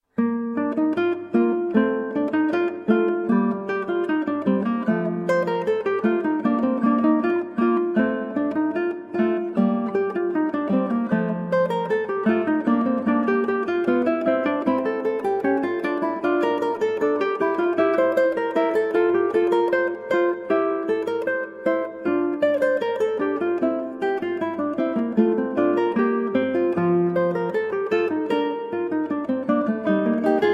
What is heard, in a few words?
Affettuoso, Guitar; Telemann; Recorder Duet in Bb TWV 43 107